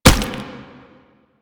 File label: Proto Infested Brachiolyst Step A; Enemies; Steps; Warframe